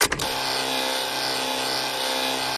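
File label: Typewriter Electric; typewriters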